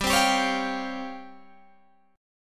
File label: Db Major 7th Flat 5th G, chord